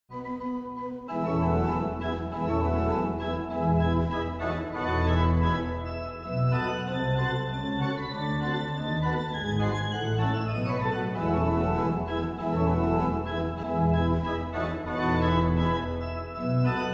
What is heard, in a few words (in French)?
Marche en ut majeur